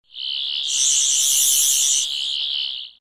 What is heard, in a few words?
screwdriver sound; sonic; dracotonis